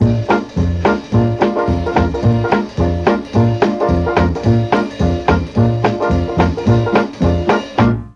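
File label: D2 dixie trombone, mellotron; DIXIE+TRMBN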